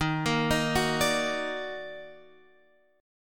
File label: D sharp Minor Major 7th, Guitar Open F; arpeggiated